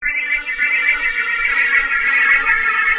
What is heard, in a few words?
SYNTH D+B 160 C, Drum+Bass, Keys; eJay